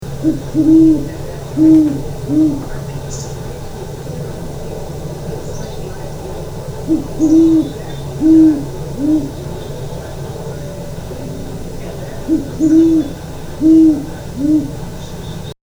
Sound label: Great Horned Owl